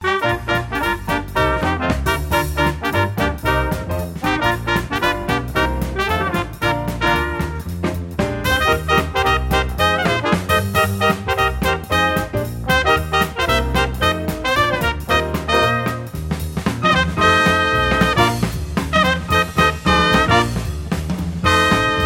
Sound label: Dixie Riff; MIUI12